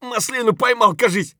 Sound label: hurt light; Escape from Tarkov; scav5